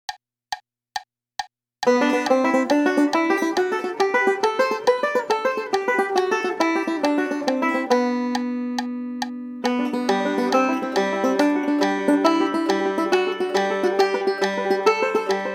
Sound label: string banjo exercices; bergeron guy